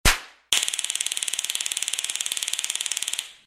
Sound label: taser fire